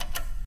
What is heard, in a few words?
Button Generator